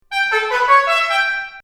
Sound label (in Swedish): bilhorn sms